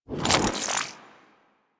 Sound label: Spear Throw Charge, Weapons; Warframe; Corpus; Spear Gun